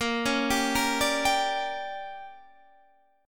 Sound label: D Major 7th Suspended 4th Sharp 5th